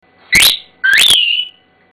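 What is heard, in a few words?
Come Bye, whistle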